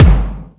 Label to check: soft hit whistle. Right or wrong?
Right